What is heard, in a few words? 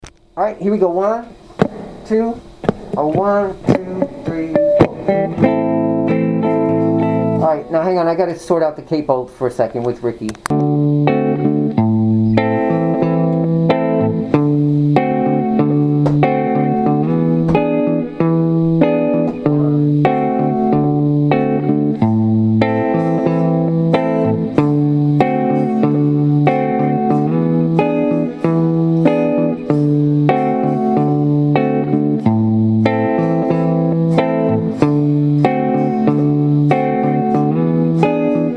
melodic minor mode I play along